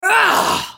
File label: spell cast effort; Diana